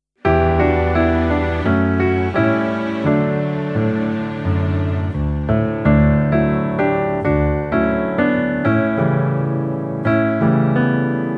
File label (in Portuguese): Bênção de Partida Playback